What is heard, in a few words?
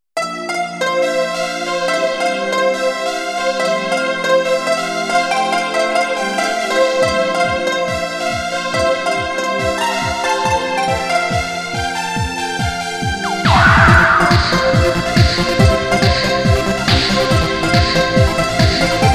fresh noise